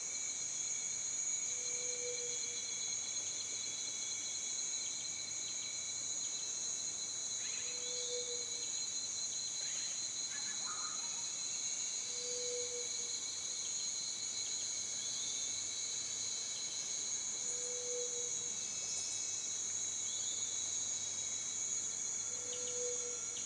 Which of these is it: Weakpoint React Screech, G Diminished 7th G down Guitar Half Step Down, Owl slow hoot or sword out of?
Owl slow hoot